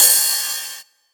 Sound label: Ride, Cymbals